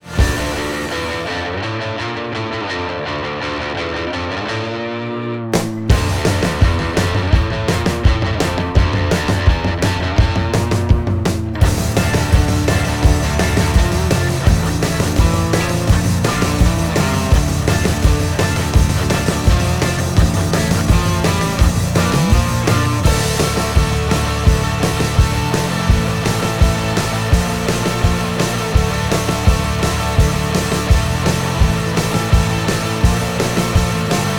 Action; music for videogames

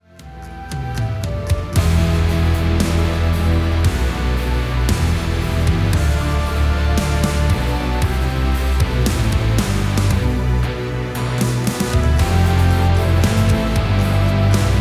Trailer

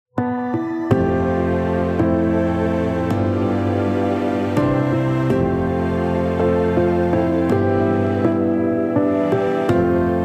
Piano, music for videogames

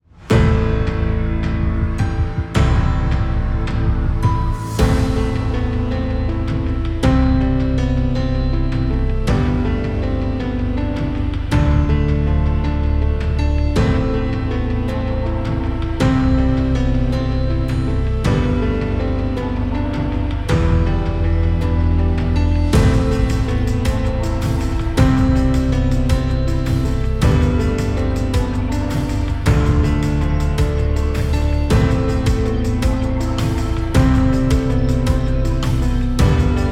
Action; music for videogames

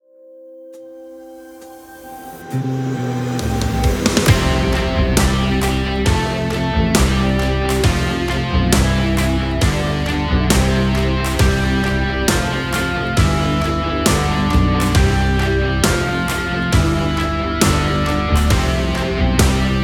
Twinkle

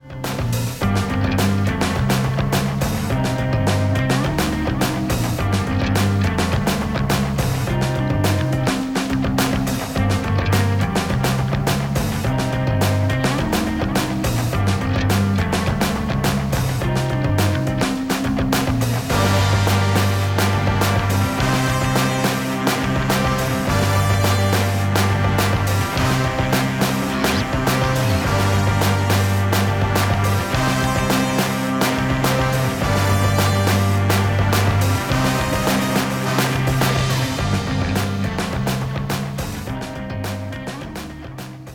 Basket Funky; music for videogames

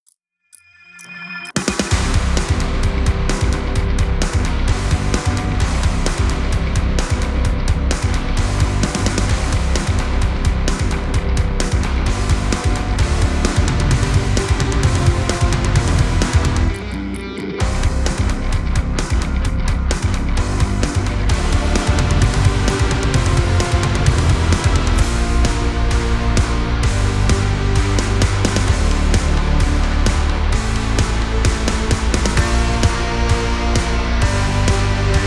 Countdown, music for videogames

